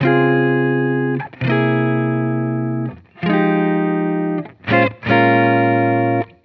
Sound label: Electric Chord Loop 150 BPM F Min; Cymatics